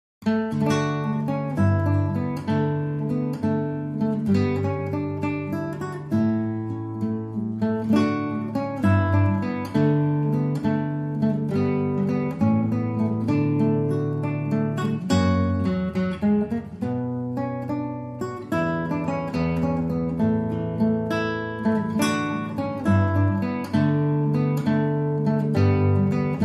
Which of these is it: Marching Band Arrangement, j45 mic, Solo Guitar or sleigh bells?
Solo Guitar